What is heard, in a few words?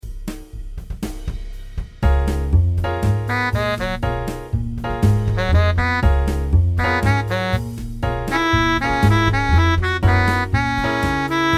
MIDI blues; spellman studio